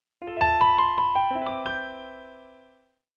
Pianissimo, notifications; Samsung SCH-W999

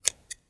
Cutting Paper; Realme 8

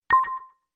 Pleasant Ding, notifications

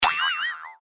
roulette

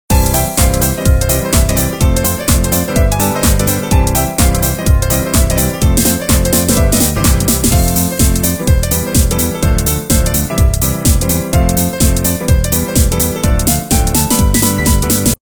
Shuffle; Kyocera; DuraXV-LTE

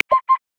Golden-rumped Tinkerbird; Xiaomi Redmi-Note-8-Pro; Africa

